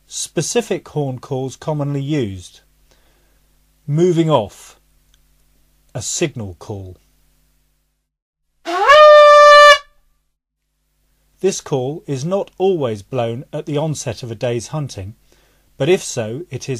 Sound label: moving off, calls